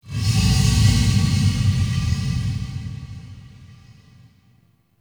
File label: Murex Growl Interior; The New War; Ambience; Cetus; Unum Tower